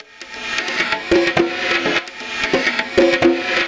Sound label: greasy conga, Deep House 130bpm